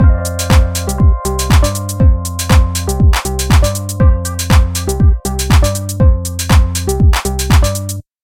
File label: House loop quantized